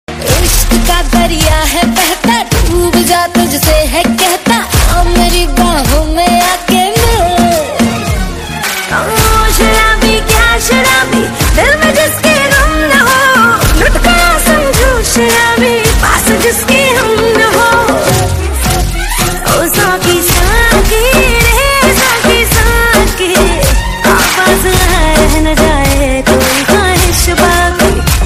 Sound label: Romantic Hindi Female Song Ringtone; ringtones